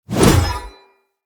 Great Sword Whoosh Air; Weapons; Swords; Dendrit Ara Great Sword

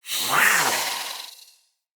Catbrow Feral Vocal Attack Medium

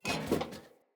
Corrupted Battle Dog Foley Fill

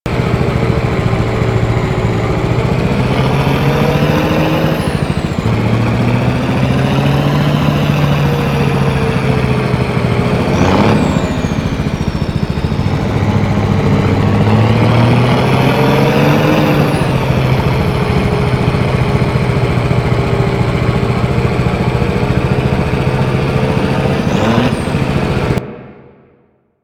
player truck loop; Warframe; NineteenNinetyNine; Stage8ShitboxConvoy

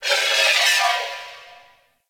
Ship Metallic Data; Warframe; Corpus Ship Remaster

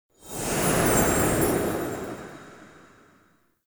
Directions Whoosh; Bombastine; Warframe; Duviri

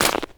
Step Ice, Footsteps; Enemies; Foley; Warframe; Grineer Royal Jester

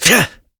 Adult Operator Melee Swing Exert; New War

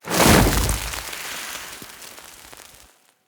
Fire Death Burst; Impacts

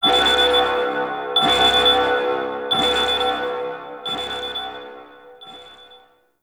Anchor Rewind End; Orbital Station; Warframe; Tau

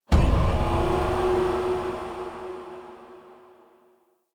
Puzzle Rune Hit B; Circade Community

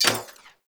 Burst Gun Mech; Warframe; Archwing

